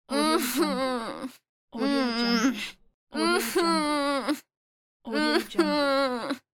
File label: girl disappointed sad